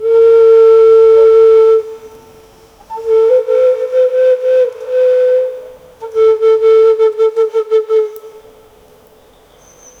soft flute bird, blowing

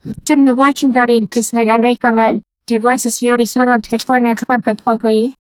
realistic sounding long s, gassy

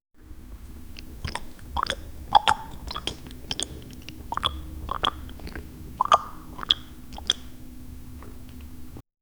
cinematic sound of two, crystal

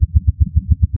a futuristic synth pulse; neon

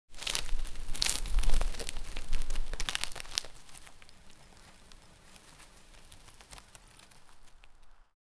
cutting vegetables

